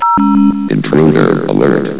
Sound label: intruder alert